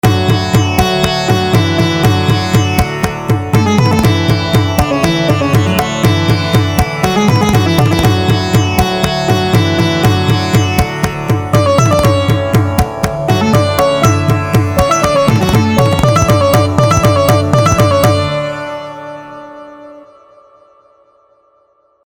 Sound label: Sitar Maestro; LG AX585 Rhythm